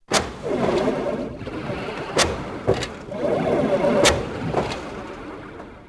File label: machinery, tracks